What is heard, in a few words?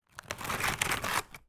rice cracker remove from packet; zapsplat